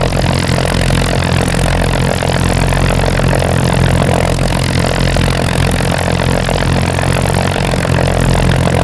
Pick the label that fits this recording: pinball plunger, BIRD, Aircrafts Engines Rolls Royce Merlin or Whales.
Aircrafts Engines Rolls Royce Merlin